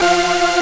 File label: synth